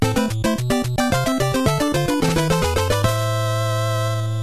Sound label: stage clear